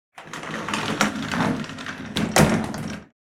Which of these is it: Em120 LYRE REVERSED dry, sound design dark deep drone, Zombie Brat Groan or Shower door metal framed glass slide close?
Shower door metal framed glass slide close